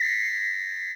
percussion, Millenium MPS-400

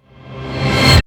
REV Orch.Hit, Roland JV-2080

